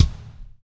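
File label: solid floor tom, verby kit; Spectrasonics; Burning Grooves CD2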